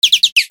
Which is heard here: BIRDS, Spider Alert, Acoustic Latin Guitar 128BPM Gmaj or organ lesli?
BIRDS